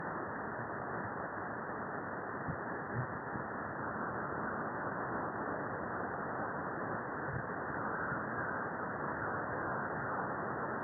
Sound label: 9,45MHz